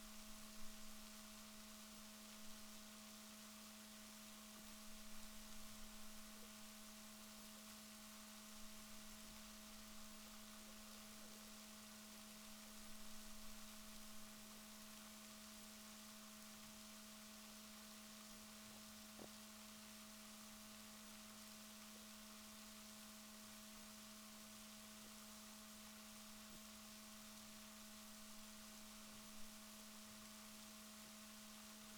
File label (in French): rorquals negatif, Balaenoptera Physalus